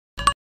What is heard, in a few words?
video record